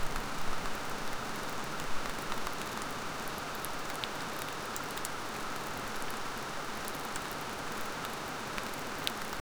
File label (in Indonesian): hujan rintik tetesan di, rain